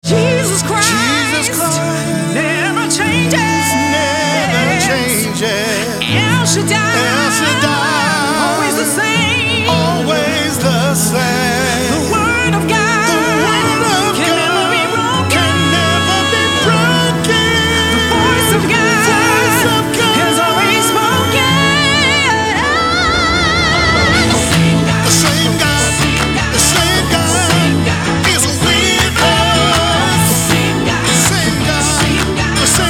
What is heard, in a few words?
Acoustic